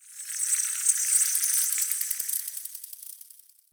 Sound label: Insect Buzz; Warframe; Eidolon Plains; Ambience